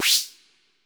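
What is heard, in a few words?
stereo noise, hi-tech kit; Crypton - DrumBase5000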